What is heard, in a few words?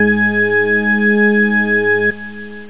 Pipe Organ; CrapTrack